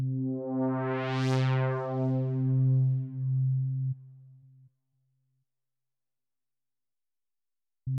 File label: Trance